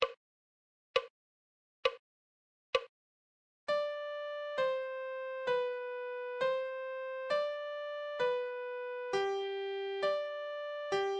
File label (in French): Clé de Sol, Adagio